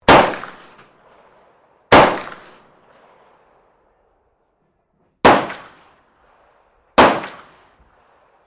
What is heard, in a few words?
PISTOL 357 AUTOMATIC FIRING FOUR ROUNDS; Handguns, non military; Pistols (Sound Effects:Guns)